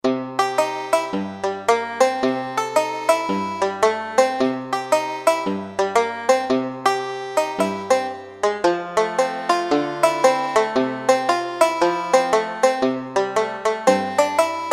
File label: Banjo, Roland JV-2080